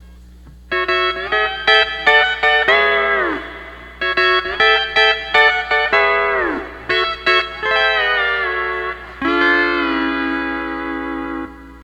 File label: 12 String Lap